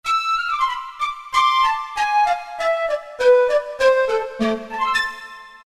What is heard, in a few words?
Breathing Pipe; Roland PN-D50-01